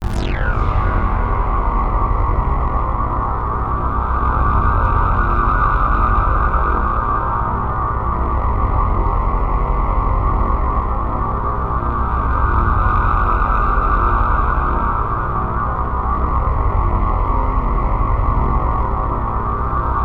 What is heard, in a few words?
Access Virus B; Synthworx